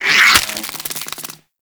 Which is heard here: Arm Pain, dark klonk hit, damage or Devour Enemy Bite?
Devour Enemy Bite